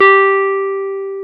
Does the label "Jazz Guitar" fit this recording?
Yes